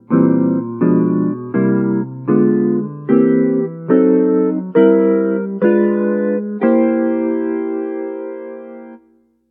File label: Guitar Drop 2